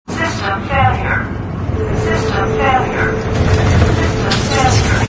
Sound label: system failure; Source engine